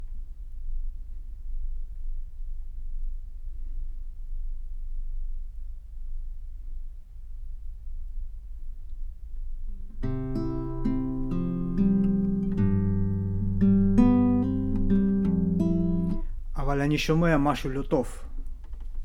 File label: GTR